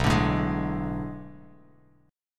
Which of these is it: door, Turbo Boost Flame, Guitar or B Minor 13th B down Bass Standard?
B Minor 13th B down Bass Standard